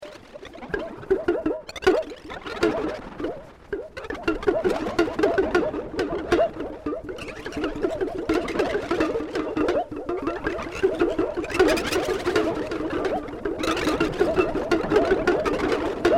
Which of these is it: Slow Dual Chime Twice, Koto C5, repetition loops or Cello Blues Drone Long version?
repetition loops